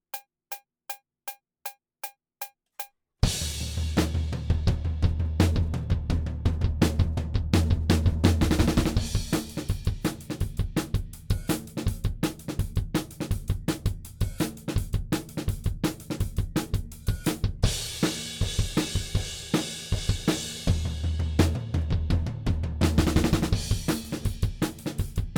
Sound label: Drums ONLY; Pre Pro